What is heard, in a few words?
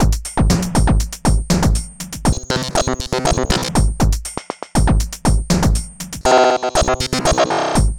Miami Bass Fuzz, Modular Electronica; Sonic Foundry (Sony Creative Software)